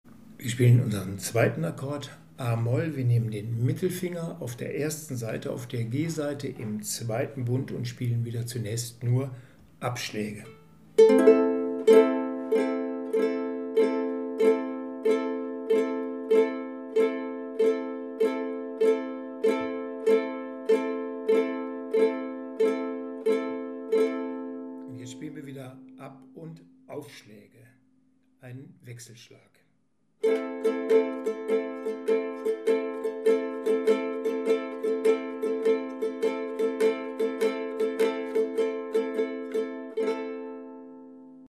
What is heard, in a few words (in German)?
A Moll Akkord Ab und Wechselschlag, ukulele